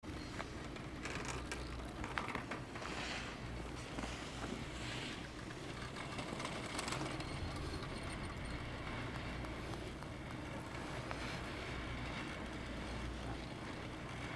télésiège

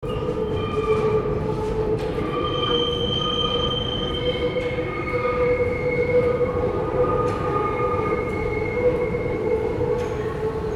grincement metro

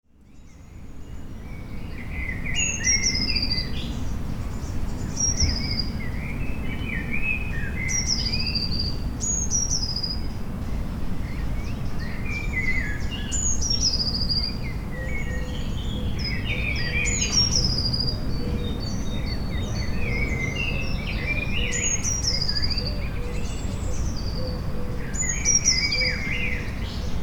oiseaux; oiseaux beaumonts

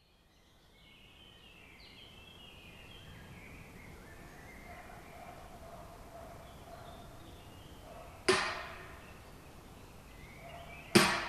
bucherons

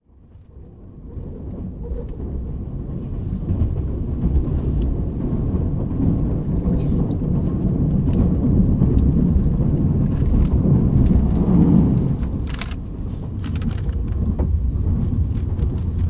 Dans le TGV

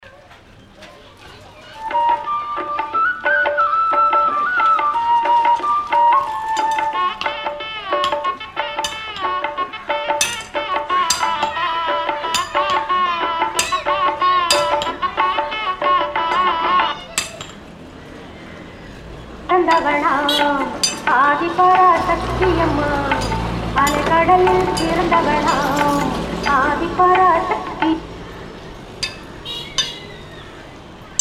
ambiance soir

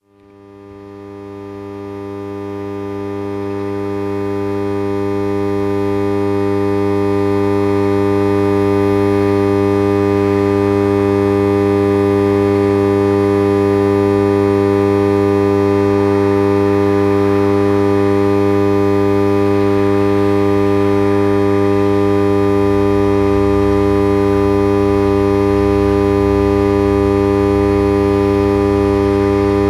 transformateur

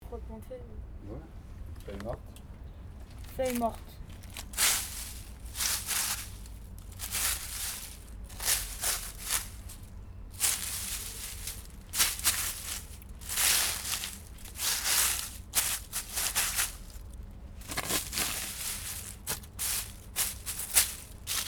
feuilles mortes

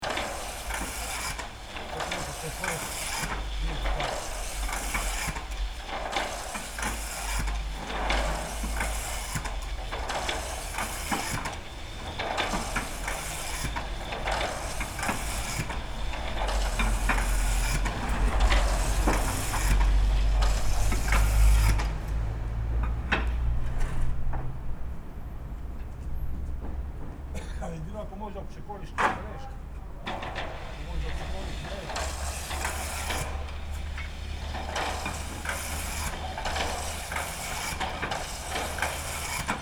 tuiles qui glissent

